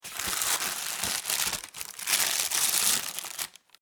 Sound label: Food Cereal Bag Open; Household; SFX Bible